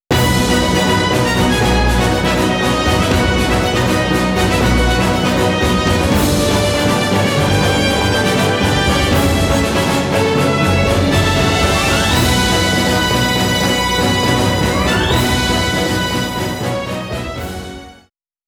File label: Victory!; Tales of the Rays